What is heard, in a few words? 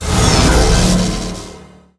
Shock Blade; Seer; GamigoNA